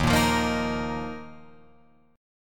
Eb Suspended 2nd down Guitar Open F; Ebsus2 strummed; JGuitar

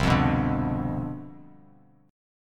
C Major 7th Suspended 2nd Suspended 4th, chord; strummed; CM7sus2sus4; 8,8,9,7

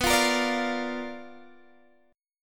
B Minor Major 7th Flat 5th B, Guitar, Open D

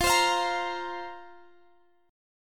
Bb Suspended 2nd F, chord

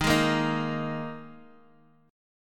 D sharp Suspended 4th D sharp; chord shape

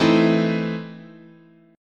E Major 7th Suspended 4th Sharp 5th, chord

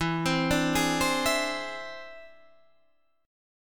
E Augmented 7th E

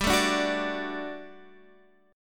G Major 7th Suspended 2nd G down Guitar Standard; strummed

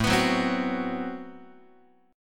A Minor 6th Add 9th Guitar, chord; Am6add9 strummed; JGuitar

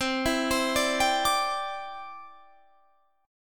C Major Add 9th, Guitar, Standard; arpeggiated; JGuitar

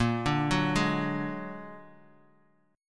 Bb Augmented 7th Bb down Bass Standard; Bb+7; arpeggiated; JGuitar

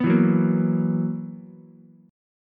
B Major 7th Suspended 4th B down Ukulele; BM7sus4; strummed

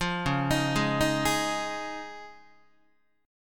Db Major Add 9th, Guitar; arpeggiated; 8,9,6,6,7,7; 4A,4E,5A,5D,5G#,6C#

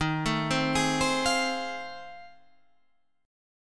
F 7th Sharp 9th

